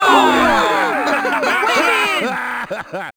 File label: foul, Crowd; English; Freestyle Street Soccer; XBOX